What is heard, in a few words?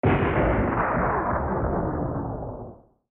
thunder